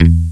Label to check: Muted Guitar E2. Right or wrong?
Right